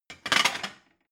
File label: plate shelve slide hard